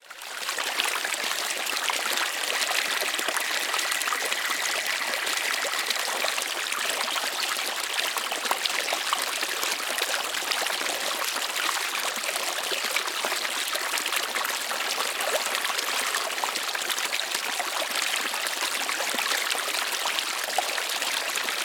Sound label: nature creek forest shallow birds buderim forest qld australia